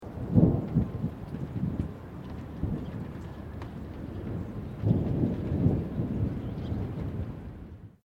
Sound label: THUNDER; Elemental weather effects; SoundEffects-Foundation4